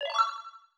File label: match start; Obsidian